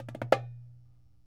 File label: Dumbek, World Percussion One Shots; Sony CS